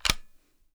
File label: m24 bolt down, sniper; weapons; garrysmod